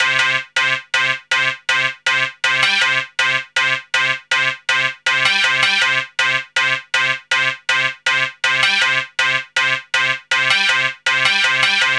Dance Synths, Hardcore Loops, Synth Loops

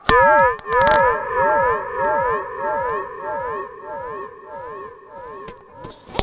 SPACEY R, Funk Guitar; Zero G - Funk Guitar

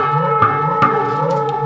Void Perc; The Void; Processed Drumkits

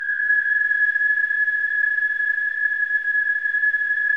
WHIS, whistling; Spectrasonics; Symphony of Voices

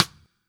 STICK; East West

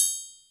Triang, Percussion

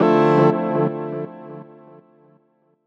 Dm, Chords; Yasssss; M8; Electronisounds

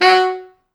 F#3, Pop Brass

